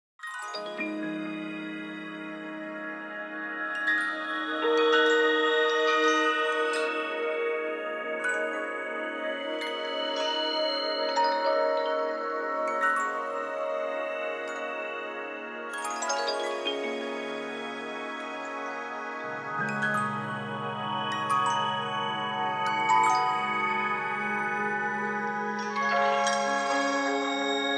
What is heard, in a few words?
Tintinnabulation Purity Swell; soniccouture flowstate; Kontakt